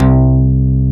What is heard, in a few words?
SMA, synthesizer bass; VFX-SD